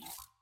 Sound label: loading